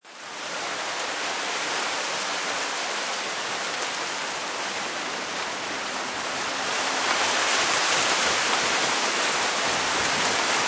Wind Palm Trees, Wind gusting and blowing through palm tree leaves; Wind Thru Palm Trees; Weather